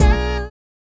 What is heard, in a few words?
Clapper Board